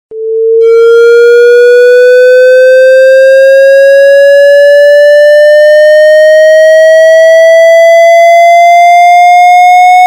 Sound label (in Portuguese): som agudo e alto